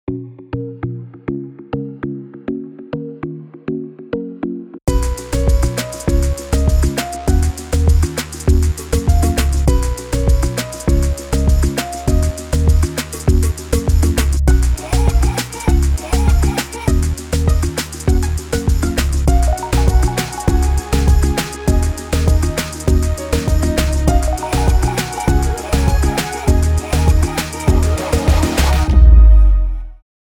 reggae mystique

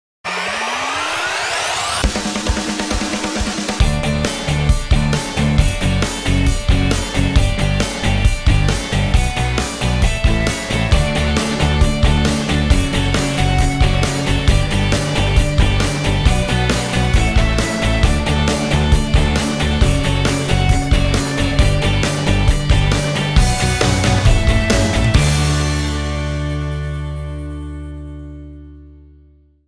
Instrumental

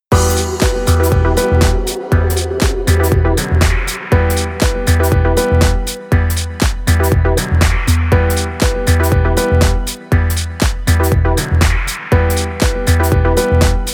DIALTONE

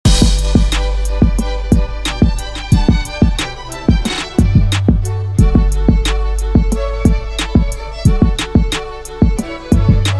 ORCHESTRATE THE SPACE

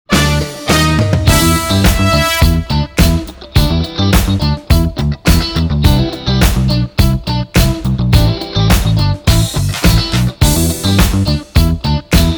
instrumental

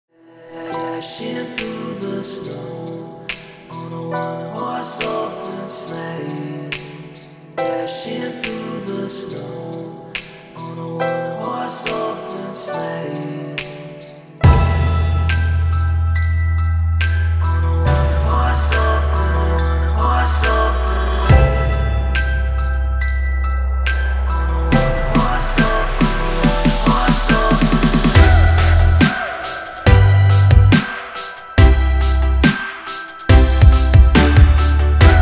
ONE HORSE OPEN BASS DROP

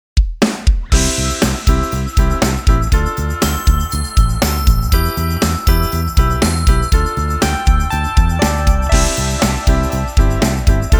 INSTRUMENTAL

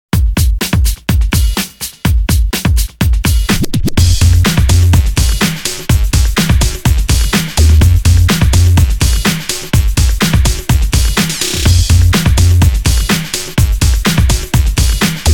BREAKBEAT

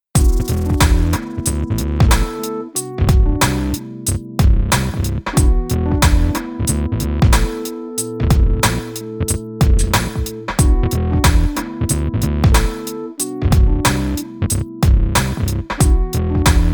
ROCK HARD GROOVE

